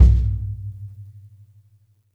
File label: kick - snares on; JazzFunkKit